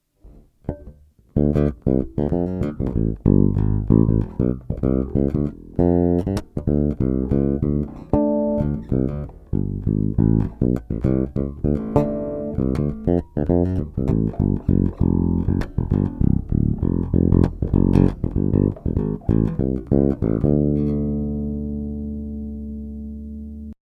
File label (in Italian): bridge pu toni chiusi; Biarnel lowZ 3e2; Attiva a due vie; Bridge pickup; Acero Occhiolinato